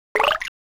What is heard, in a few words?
Coin; Emeraldia